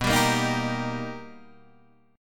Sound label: B Augmented Major 7th B Guitar DADGAD, chord